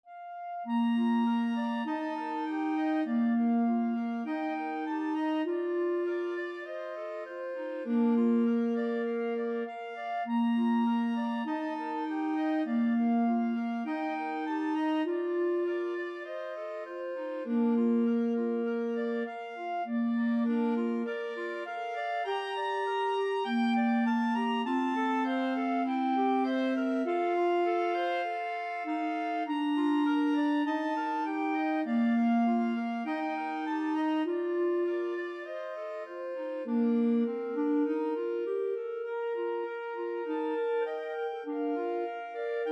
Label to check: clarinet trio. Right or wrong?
Right